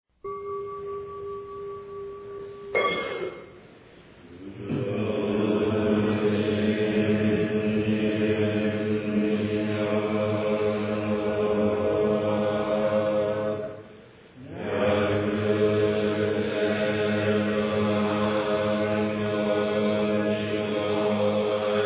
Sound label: chant